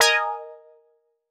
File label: Quart Stab Bb; PowerFX - Nu Jazz House